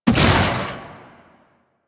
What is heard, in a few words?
Spear Shot, Weapons; Warframe; Tenno; Priest Spear